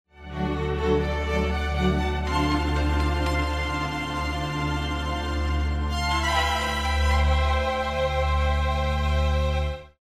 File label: round ten sec count; music kits custom